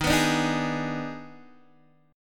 Eb Major 7th Suspended 4th Sharp 5th, Guitar DADGAD; x,6,9,7,6,6; down